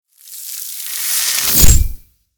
Ice spell designed impact

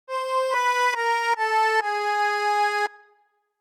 Cartoon disappointment failure

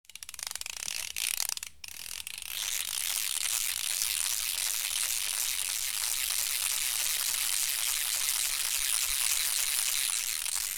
Fishing rod reel fast

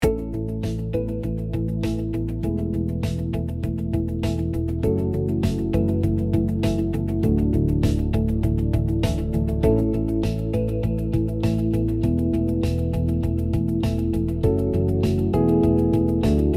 Corporate positive build the future

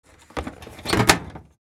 Ammunition box metal case close; Military Equipment Sound Effects

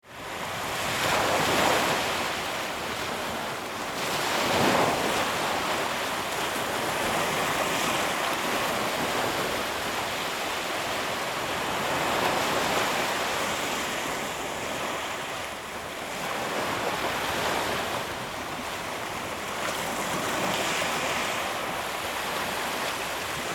Beach ambience quiet and calm with sea waves

